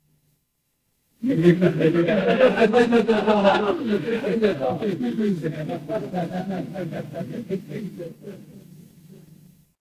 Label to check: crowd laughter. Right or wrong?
Right